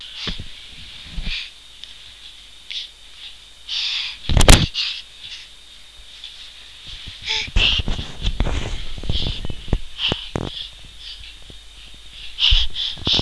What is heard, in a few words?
breath